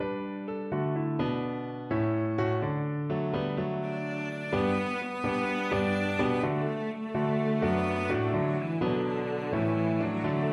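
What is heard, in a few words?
violoncello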